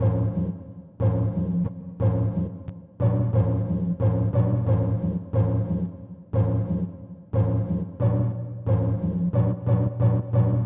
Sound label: Synth